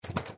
door open; Yet Another Pixel Dungeon; Android

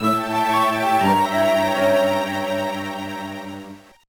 Failed, gba; Level 6, Level 10; Atlantis The Lost Empire 2001

